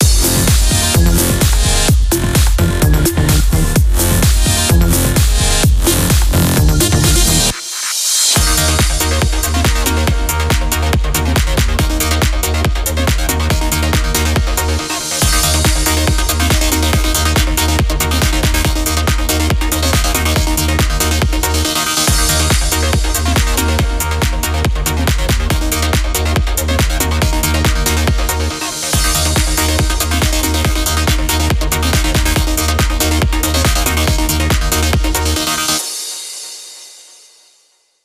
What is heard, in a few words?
Goal last; Gran Turismo 6